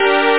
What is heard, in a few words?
synth strings